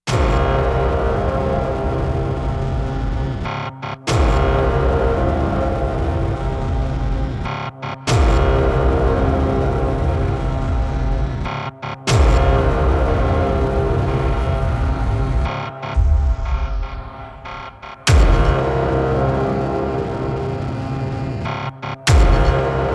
Awaken No Hat No Hi Synth; Sound Design

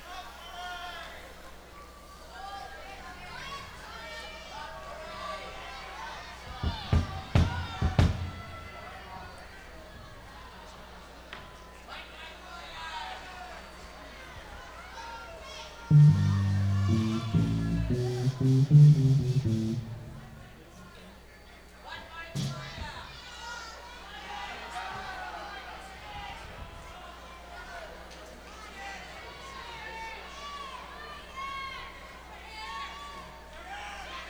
Tuning-Breather

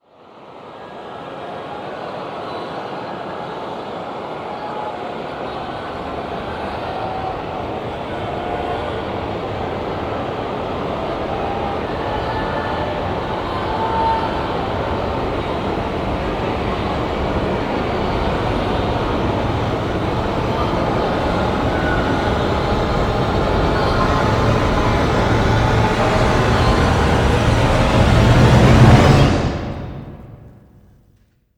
Orch Rise; трейлерная музыка

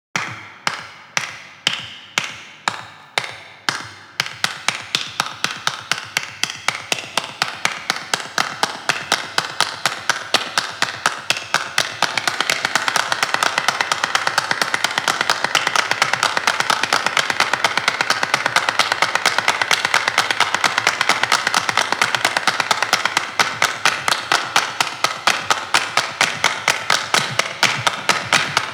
Clapping

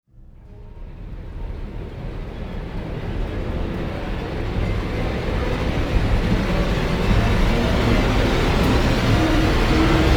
Orch Rise

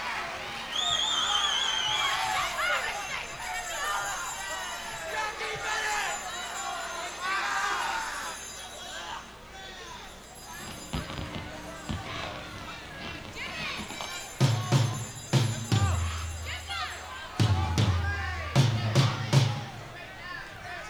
Tuning-Breather

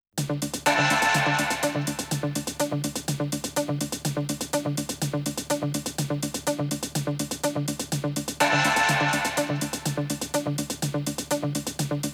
instrumental

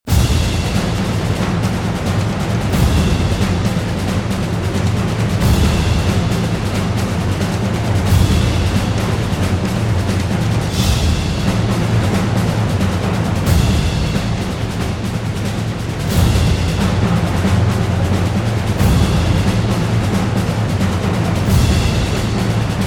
Drums; Abbey Road

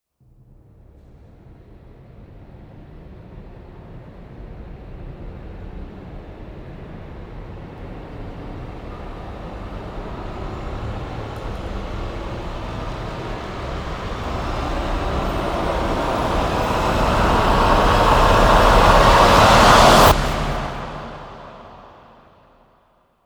Orch & Choir Rise

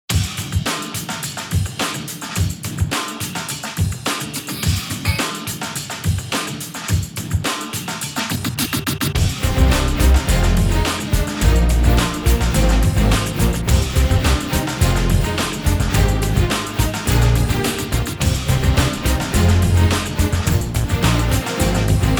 Imperativa (No Choir), Orchestra

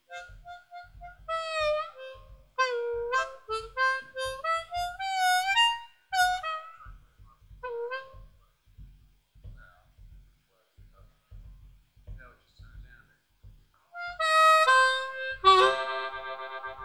Harp Soundcheck

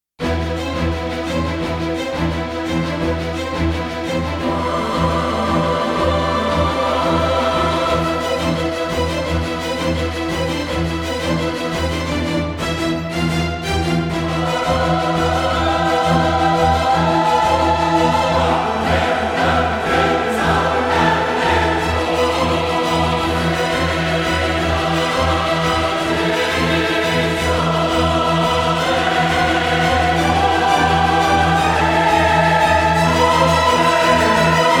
Orchestra And Choir Only; трейлерная музыка

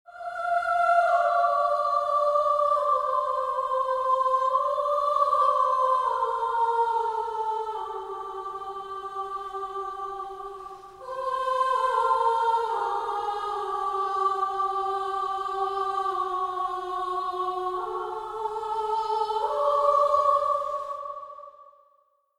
Solo & Choir; Abbey Road

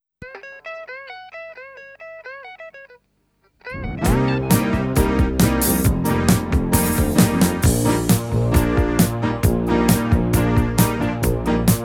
Guitar Solo

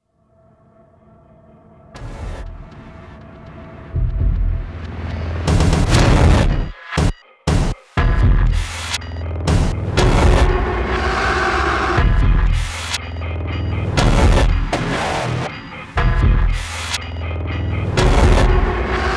Alarming, Sound Design Beds and Elements

